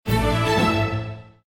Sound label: WIN; Temple Of Secrets